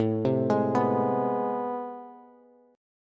A Minor Major 9th, Bass; AmM9 arpeggiated; 17,15,18,16; JGuitar

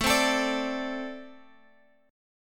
A Minor Double Flat 5th A, Guitar, Open G

